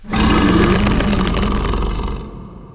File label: Shudder; Kundun